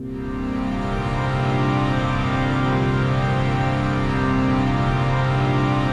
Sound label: ATMOPAD; Best Service; XX Large; Pads